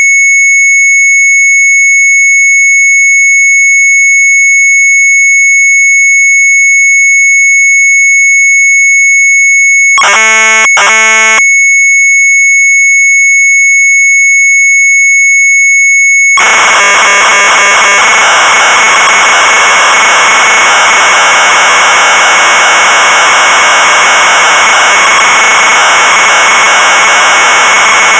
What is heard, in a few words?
MZ800 Tape; Sharp